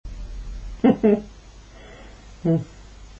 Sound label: chuckle